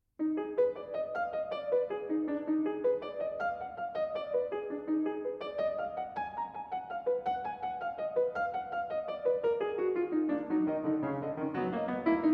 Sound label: Piano Sonata No. 6 in C-Sharp Minor III. Scherzo. Presto